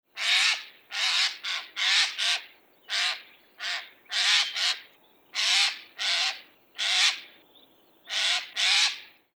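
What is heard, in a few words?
Eurasian jay call, birds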